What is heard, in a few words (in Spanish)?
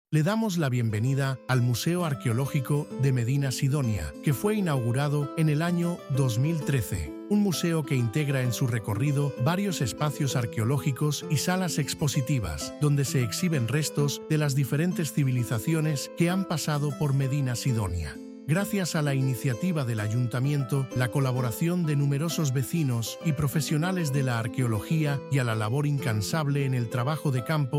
audioguia música